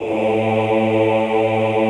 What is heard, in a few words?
OHH A1, Classical Choir; Best Service; Extended Classical Choir